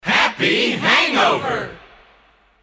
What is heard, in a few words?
christmas kit group shout happy hangover